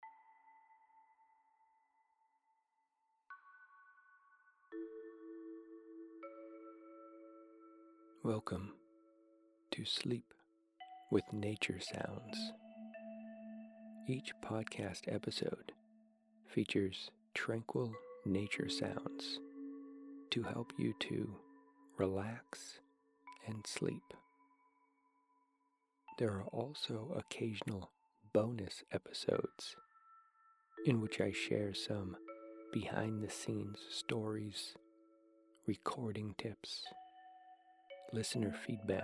rain dripping and birds; silknature

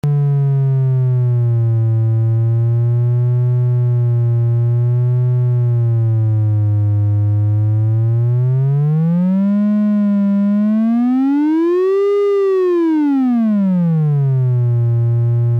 woggle mod vco; analogsynth

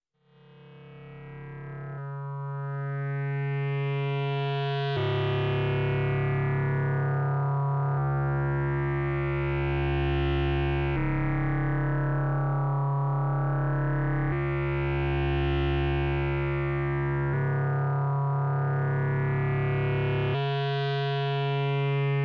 vcdo; uhbik runciter; synth; analogsynth

